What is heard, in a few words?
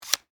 Cell Flip Phone, Technology, Cell, Flip, Phone, Antenna, Up, Cellular, Motorola